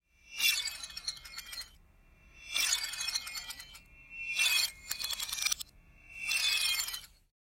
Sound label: Piano sample backwards shatter